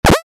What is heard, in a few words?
ui menu not select; Starbites